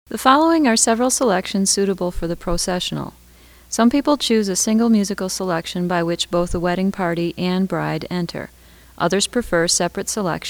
Alternate Processionals, pianist; wedding

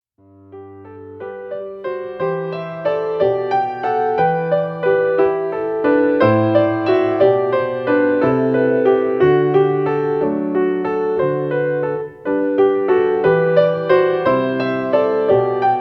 Jesu Joy, pianist; wedding; Bach

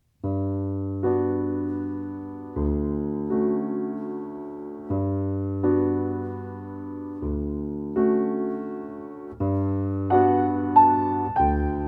Gymnopedie, pianist; wedding; Satie

